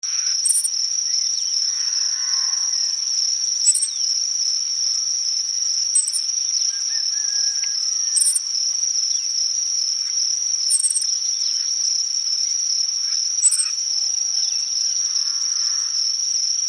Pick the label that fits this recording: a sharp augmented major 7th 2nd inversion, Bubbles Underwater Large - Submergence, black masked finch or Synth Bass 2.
black masked finch